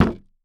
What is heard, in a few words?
Impact Table Fits Hits Hollow Wood Table